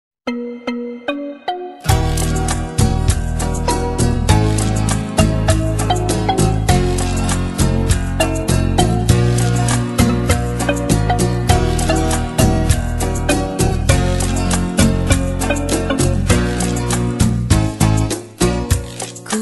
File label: Ballroom Rumba